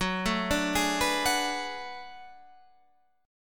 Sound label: B Minor 13th F sharp